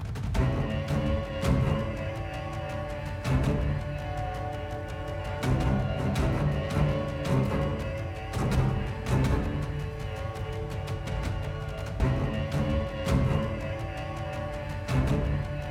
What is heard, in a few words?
BGM combat mob enemy; Babylon's Fall